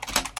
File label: carriage return; Electronica Digital; logic gates